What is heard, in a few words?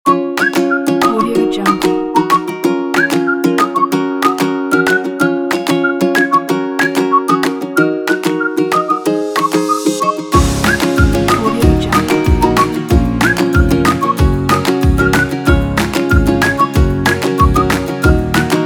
happy